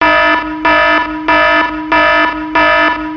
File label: alarm tone; baresip